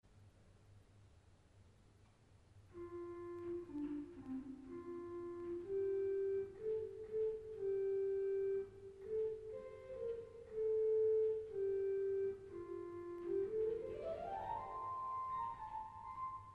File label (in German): Floeten 8, bergkirche